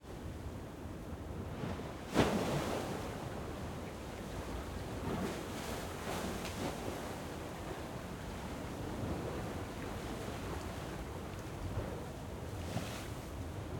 Tunnel near field splush, Sirocco Sea Storm Mediterranean sea